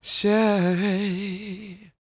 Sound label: CROON, Vocal Blues & Soul MELLOW